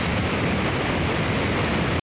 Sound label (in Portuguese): cachoeira